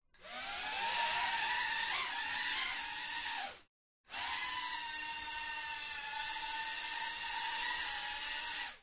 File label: Drone DR1; fox audio